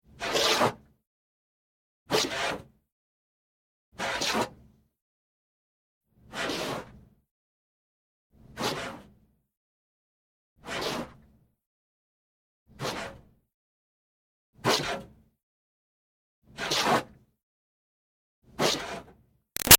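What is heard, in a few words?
Water Spray interior Car bys Corner; Car Wash; Spraying; Big Room Sound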